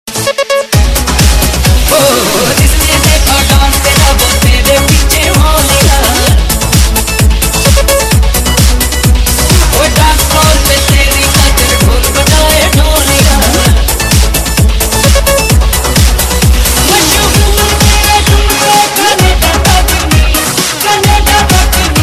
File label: remix ringtone